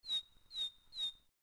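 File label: ruins ambience; poolofradiance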